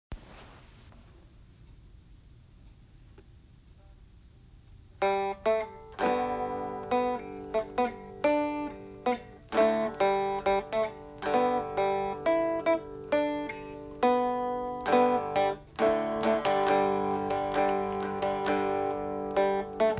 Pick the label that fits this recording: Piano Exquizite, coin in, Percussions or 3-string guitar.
3-string guitar